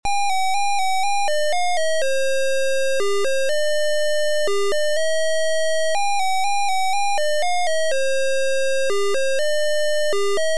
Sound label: MELODY